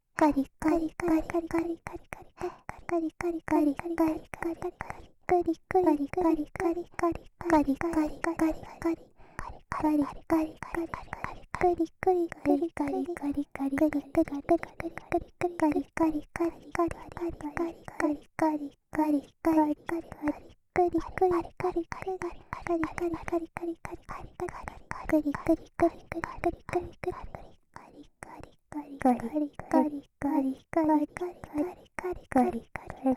カリカリ～びゅー

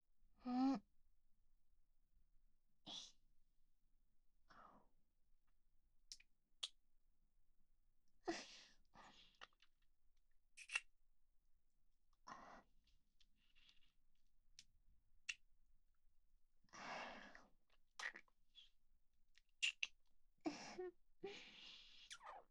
キス; SEあり

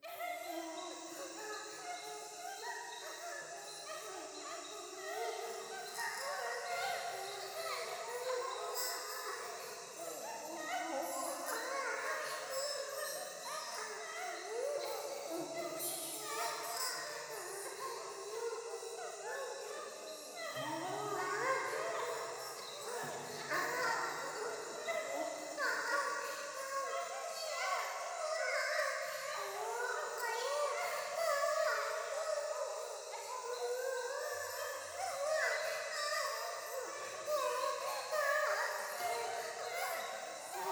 喘ぎ 山奥夜

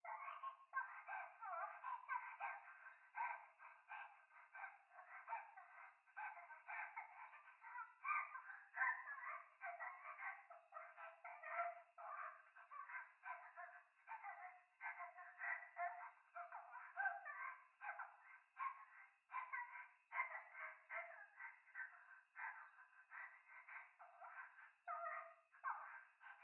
トイレ 可愛い喘ぎ

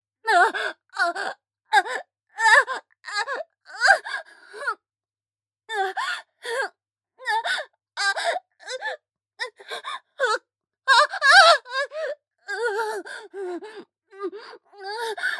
正常位・感情高ぶり喘ぎ・通常; ヘレナ

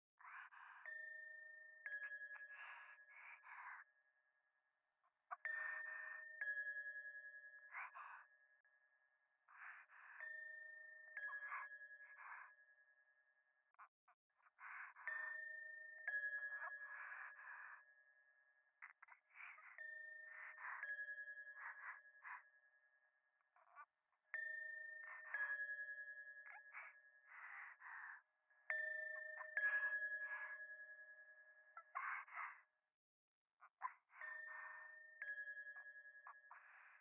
左耳 密着 処女 喘ぎ 神社

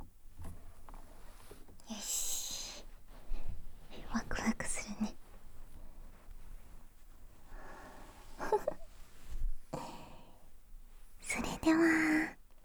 ヘッドスパ ヘッドマッサージ, ASMR

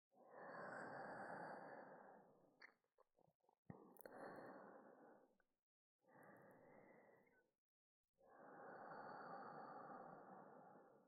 寝息ループ, ASMR

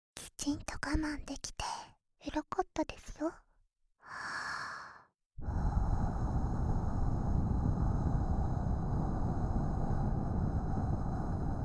【左】耳ふー, 耳かき, ASMR

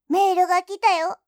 新着メールの通知

